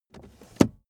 car accessories cup holder cover close fast; autos; verkehr transport

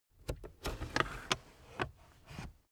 car backseat table fold in slow

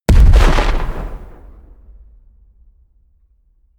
explosion fuel; explosionen; comedy-cartoon; soundpool

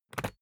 briefcase plastic latch lock key pull out ringing; industrie wirtschaft; bueroarbeit

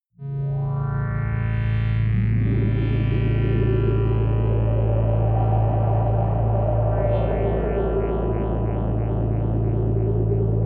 surrounding wind; drones

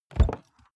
car bmw e46 interior door rear open short; Cars

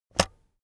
keyboard external space release heavy

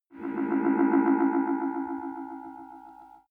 space passing flying security droid; raumschiff

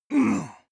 male combat hit soft; soundpool

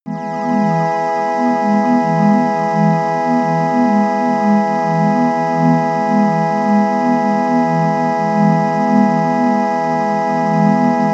organ air

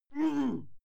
male fight yell muted

